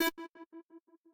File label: synth